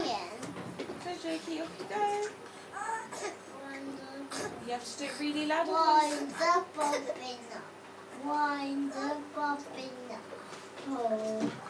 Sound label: singing to Alice in the bath